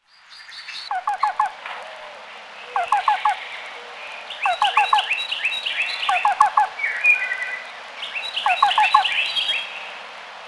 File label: black billed cuckoo